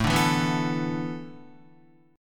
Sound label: A Suspended 2nd Suspended 4th down Guitar; Asus2sus4 strummed